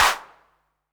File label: 808 tape clap clean; Drums